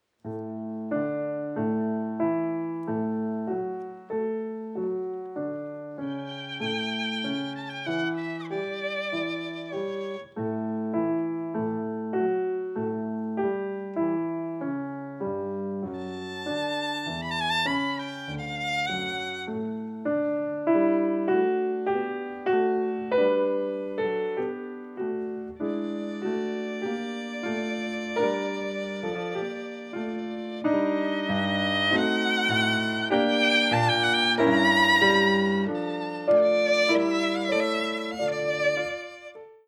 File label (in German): Andante, Violine Klavier; KV526; anfang